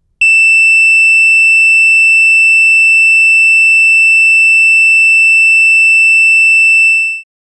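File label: Alarm Electronic Beep; hi-tech-various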